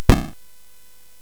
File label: SFX; Narco Police; hvsc